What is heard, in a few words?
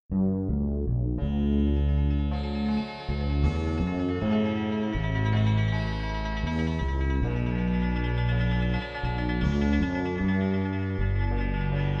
spa music 432 Hz; szczescie